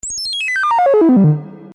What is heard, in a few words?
Digital